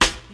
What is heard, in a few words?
raw snare drum f sharp key